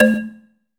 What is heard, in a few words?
SYN FX, PERCUSSION; AKAI S6000 CD-ROM - Volume 5